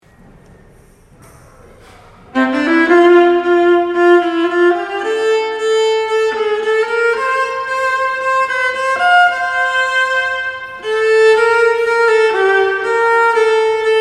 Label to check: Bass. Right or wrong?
Wrong